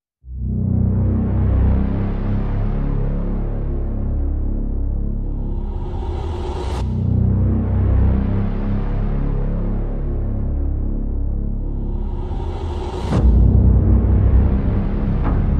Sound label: No Guitars